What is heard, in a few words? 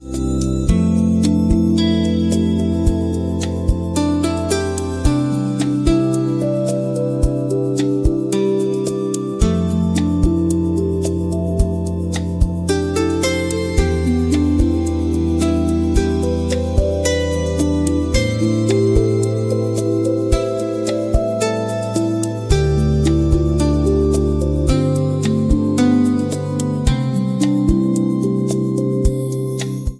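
summer rain, Calm Ambient Music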